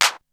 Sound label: Clap, Drums; ZERO G - Datafile DISC 2